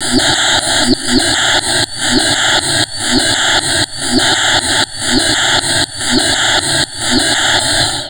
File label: Drum Loops Crazy Processed